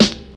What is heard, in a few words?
nineties tight hip hop acoustic snare sample C sharp key